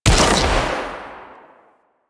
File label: Shot, Weapons, Rocket Crossbow; Warframe; Archwing; Arch Prisma Fluctus